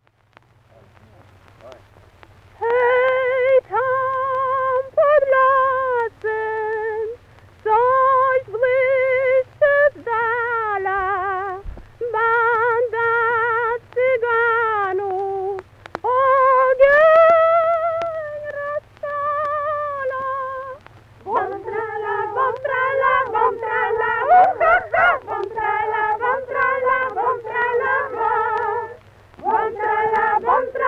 Polish Wedding Song; michigan; MICHIGAN 1938

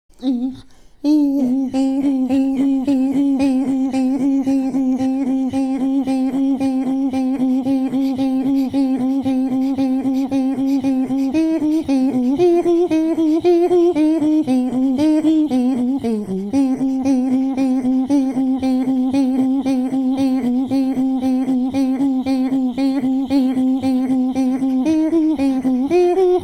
Throat Singing, INUIT

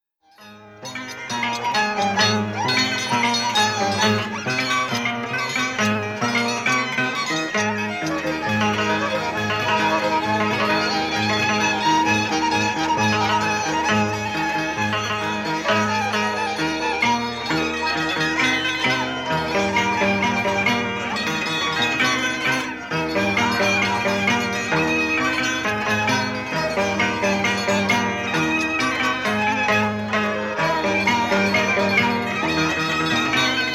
Tijeras Qawachan section; peru

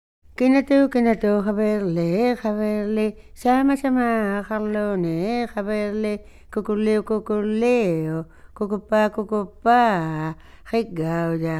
Comic Song, INUIT

